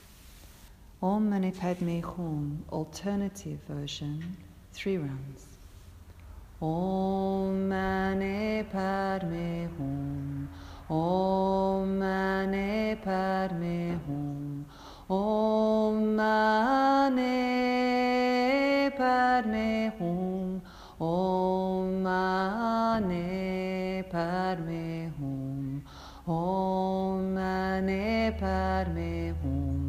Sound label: Om Mani Padme Hum Alt